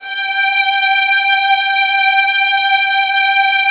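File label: violin; Virtuoso Strings; G3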